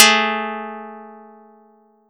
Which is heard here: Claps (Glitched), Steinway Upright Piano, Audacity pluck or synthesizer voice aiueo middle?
Audacity pluck